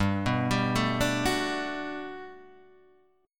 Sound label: G Major 9th, G, down, Guitar, Drop D